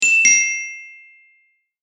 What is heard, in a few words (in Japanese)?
正解